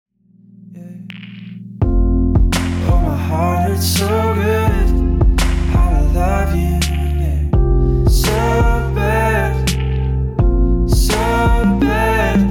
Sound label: slow grooves